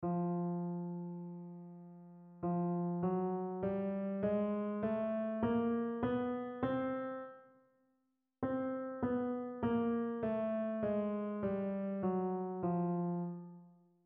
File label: F Chromatic Bass Clef; HIGH SCHOOL CHOIR; BERGEN COUNTY